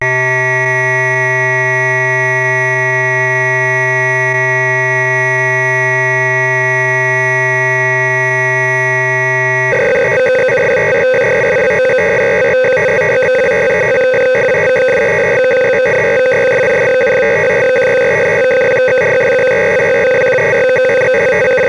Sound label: Sequential Circuits Prophet 5 factory presets C, Cassette Interface; Synthesizer Factory Patches